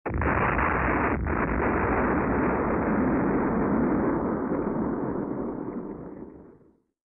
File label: nuclear explosion sound effect